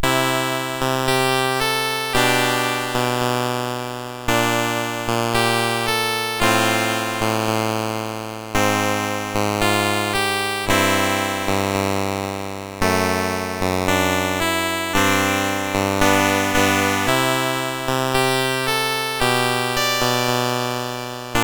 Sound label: Ballad, MOS6581R3